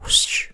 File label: wssh